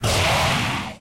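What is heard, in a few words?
undeadgolem boss damage; thedead3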